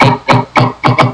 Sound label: death; muleskin